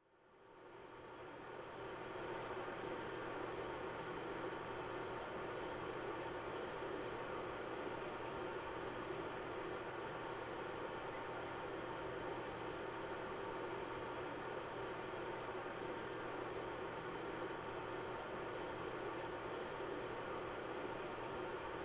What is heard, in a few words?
PC Operating Noise Far Long